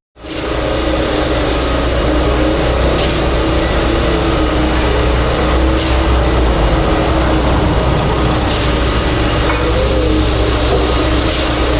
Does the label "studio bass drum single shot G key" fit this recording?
No